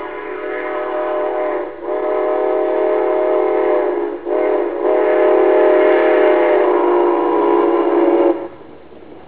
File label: train wh